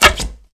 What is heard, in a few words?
release bow; Mount&Blade Warband